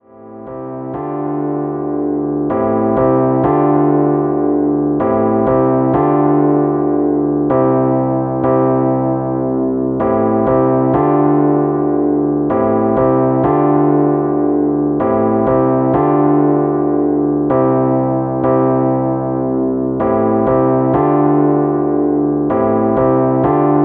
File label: Rhodes chord, hip hop chords; rhodes chord 19